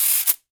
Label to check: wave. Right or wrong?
Wrong